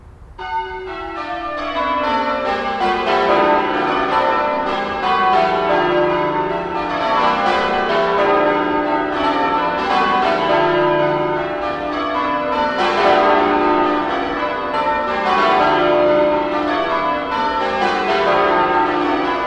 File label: bells; hitchin